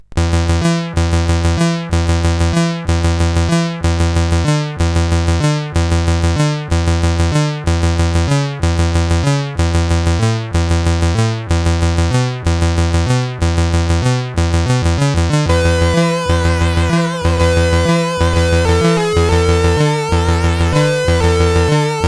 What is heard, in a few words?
Bluesbird, sid CSG8580R5